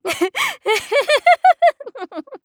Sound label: laugh; Eternal Return; Hisui; JPN